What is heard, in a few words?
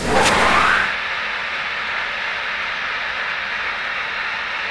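armor shredder loop; weapons; dota; snapfire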